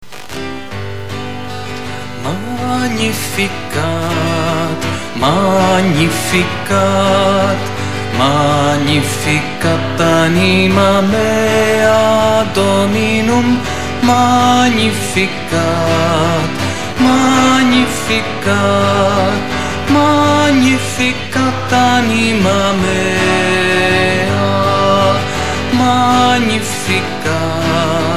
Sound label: magnificat